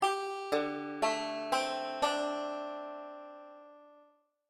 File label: d7sus4 chord, banjo; beginning 5 string